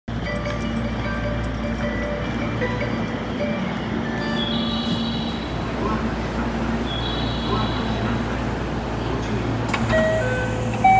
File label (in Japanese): 発車, train sounds; 福島